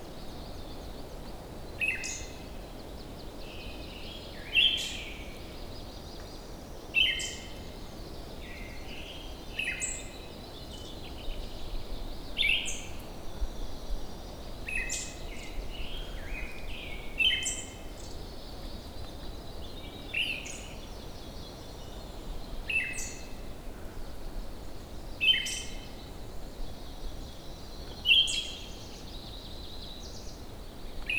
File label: siberian thrush wuying heilongjiang china